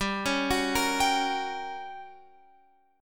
G Minor Major 7th Flat 5th, G, down, Guitar, Open F; GmM7b5; arpeggiated